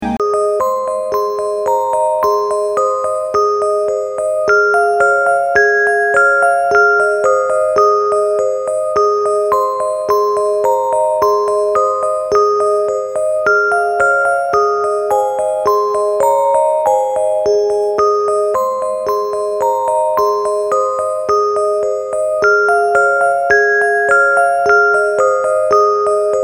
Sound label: Quiet Music